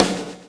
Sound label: PULL; Spectrasonics - Backbeat